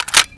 sten reload clip in

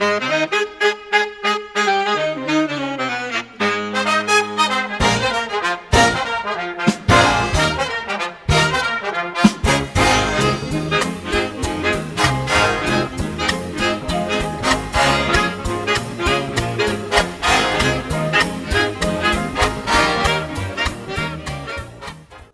uss win

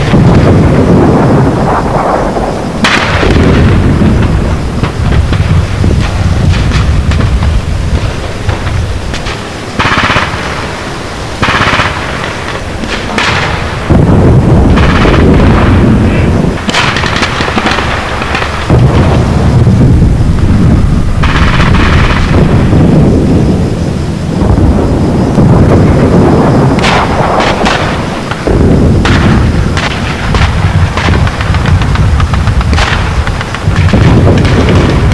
ambience

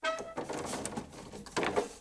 door move

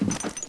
wood

